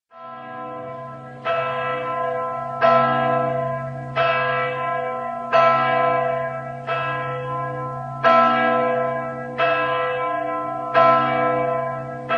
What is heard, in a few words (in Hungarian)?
HARANGSZÓ REGGELRE; szignalok; SZEKELYUDVARHELY